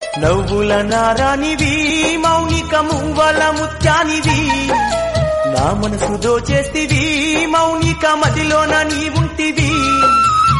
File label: Telugu Folk Song Ringtone